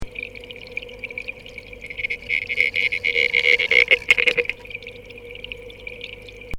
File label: platydoras, catfish